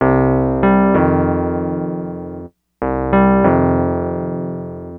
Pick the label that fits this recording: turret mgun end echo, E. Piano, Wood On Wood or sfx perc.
E. Piano